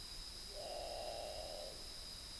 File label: Great Potoo